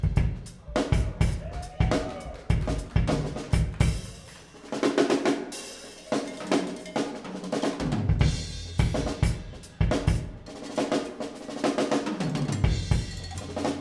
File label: Drums; Zephyr Salt Lake City UT USA